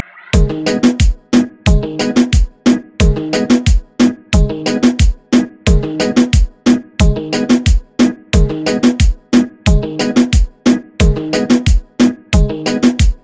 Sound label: Top40 Reggeatton